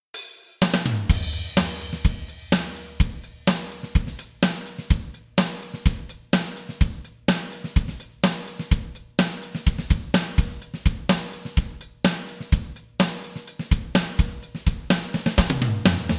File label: Drum Ideas; There is a Fountain